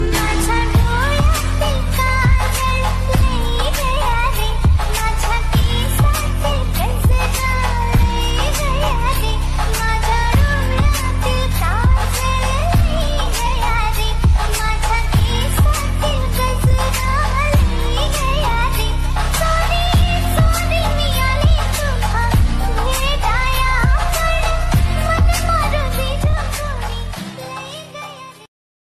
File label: romantic bollywood; bollywood ringtones